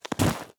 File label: Snow Impact, Icy Snow; Soundopolis